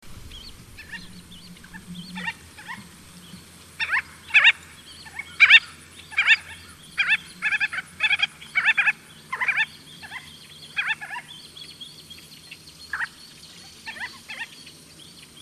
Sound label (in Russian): chaykonosaya krachka golos